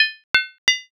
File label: armor